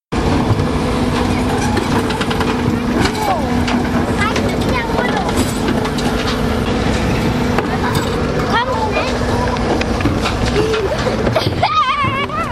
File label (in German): Müllauto